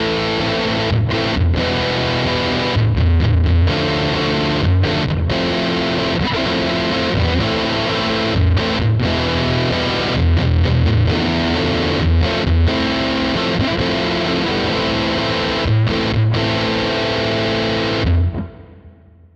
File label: Nux MG-30 Super Reverb EHX Muff